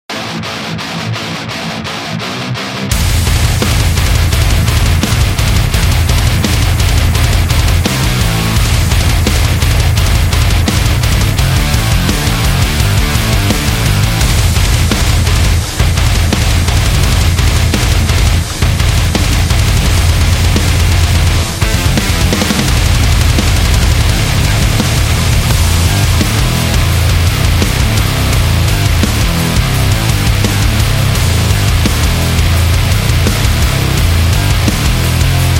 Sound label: This Metal Power